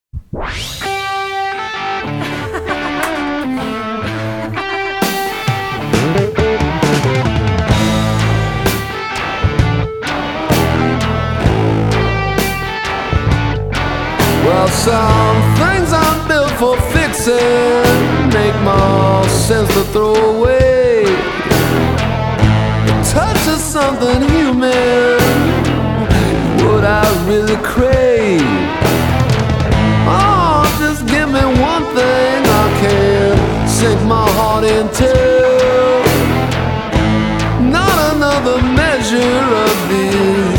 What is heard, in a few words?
Blues